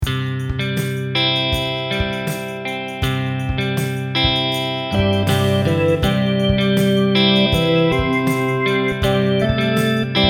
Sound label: SLOW Tempo, ukulele; Rainbow Ukulele